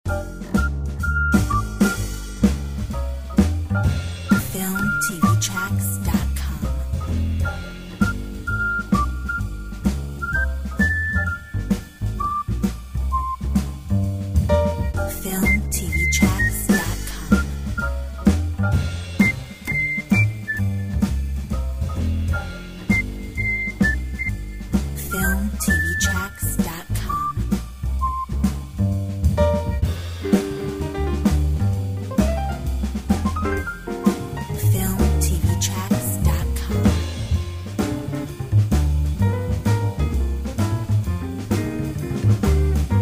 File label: Cool swing whistle